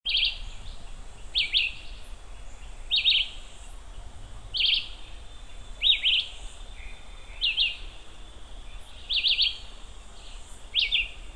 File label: Vireo olivaceus; PE Intervales; SP